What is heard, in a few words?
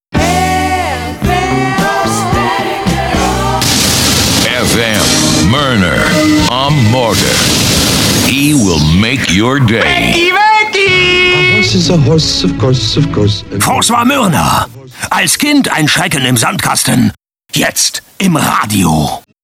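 Jingle Intro jetzt im Radio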